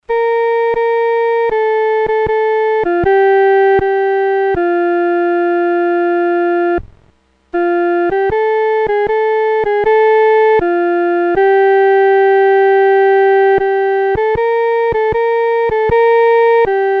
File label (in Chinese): 独奏 第一声